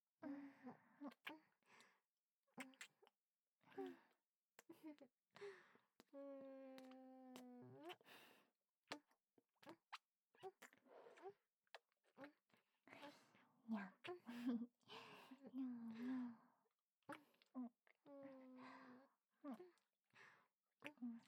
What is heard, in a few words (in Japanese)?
パンツ越し「にゃあにゃあ」キス; フェラチオ切り抜き集